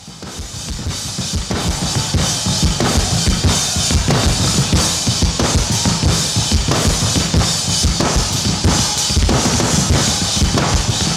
percussion solo live

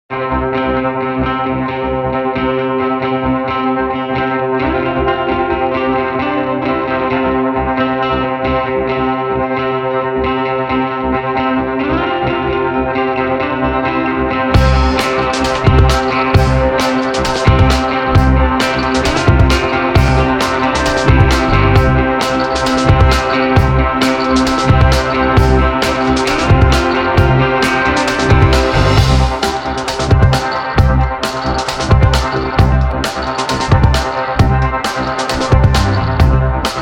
instrumental

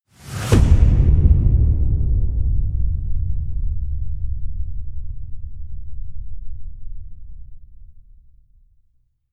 booms sub attack with whoosh

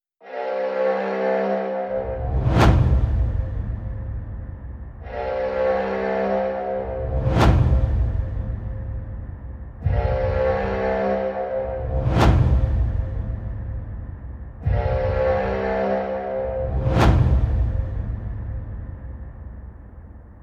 no hi perc

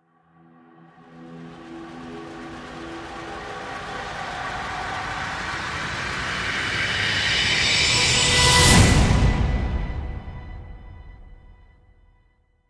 risers interstellar with slam

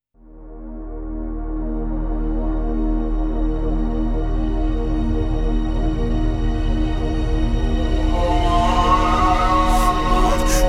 intro prelude instrumental

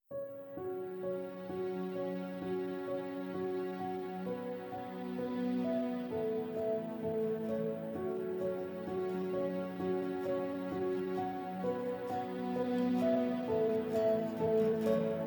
acoustic version

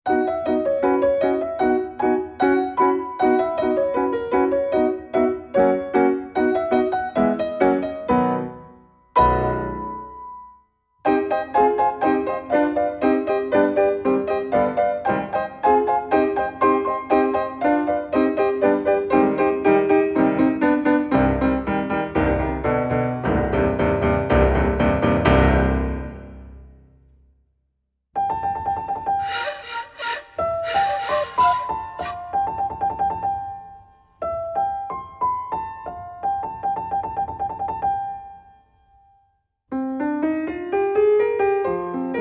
flute, piano

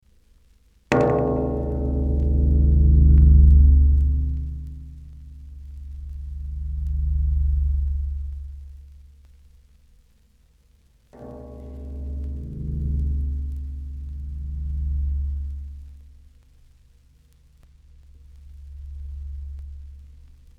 turning gong; annea lockwood

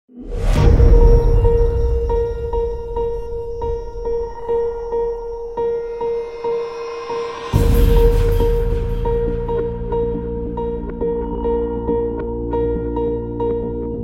no perc

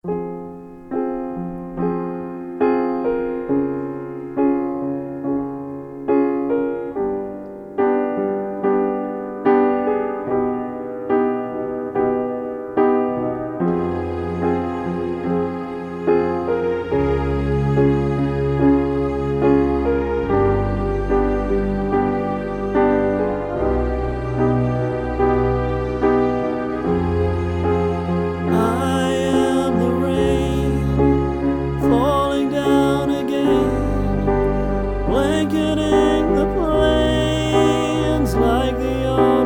acoustic version

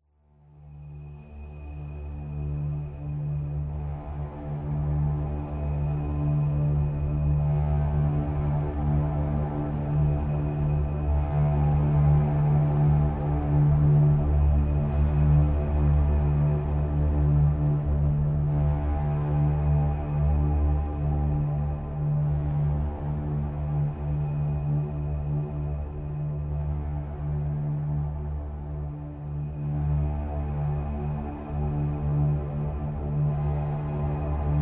ambient

